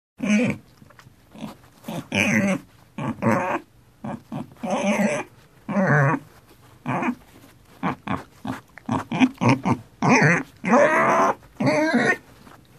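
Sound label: svinya golos, pig voice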